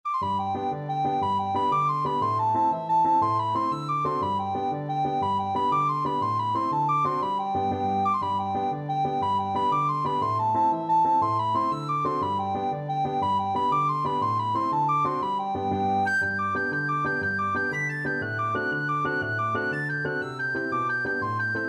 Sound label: irish jig medley, recorder